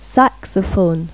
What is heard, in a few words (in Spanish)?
SAXOFON; Pipo-Aprende musica con Pipo